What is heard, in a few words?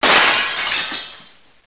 bust glass